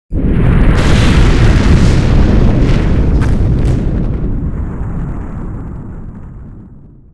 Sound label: earth quake